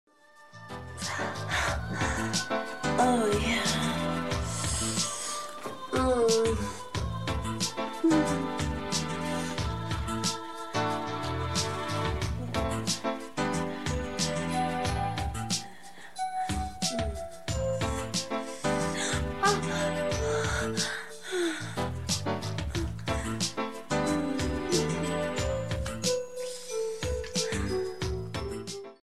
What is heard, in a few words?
sex sounds music with noises ringtone; porno